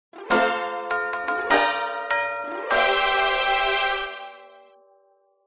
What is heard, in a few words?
level up